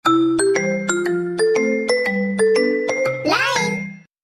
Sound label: line colling